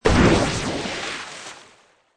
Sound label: Magical Water Blast, Magic sound of casting spell, conjuring or wizardry